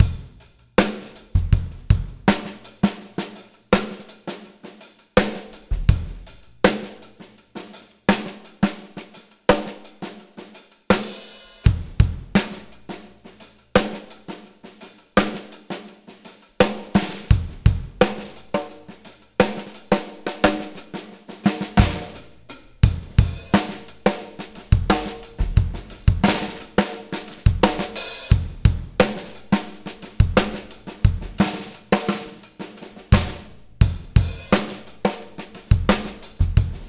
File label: roomy groove